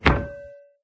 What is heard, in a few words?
drop course goal; There; ThereClient; gamekit